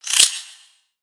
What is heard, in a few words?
Spider Attack Ranged; Lego; creatures mechanical